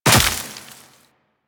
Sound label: Land Veg, Footsteps; Warframe; Tenno